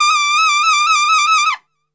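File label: D trumpet shake; Best Service; Brass Super Section; TRP SHAKE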